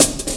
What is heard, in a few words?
Ruffneck Cut, Drum Loops 165.5; Zero-G Jungle Warfare 1, 2 & 3